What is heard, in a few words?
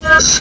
bit yes